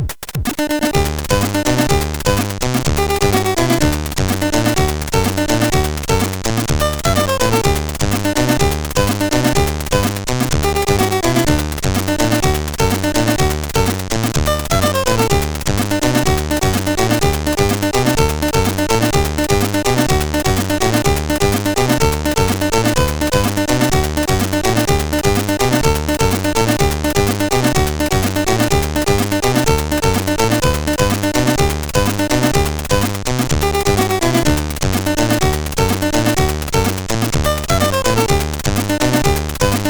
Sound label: Samba sid CSG8580R5